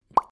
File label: Plopp